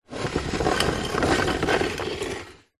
industrial wooden cart small roll hard ground short